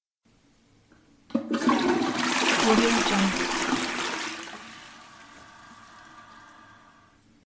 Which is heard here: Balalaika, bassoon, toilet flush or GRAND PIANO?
toilet flush